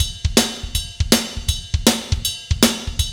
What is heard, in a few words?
Fast Shuff, Drumscapes Roland; Northstar